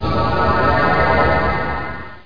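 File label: teleport; Future-Demo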